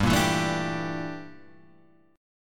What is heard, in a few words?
G Minor 6th Add 9th G down Guitar Half Step Down; Gm6add9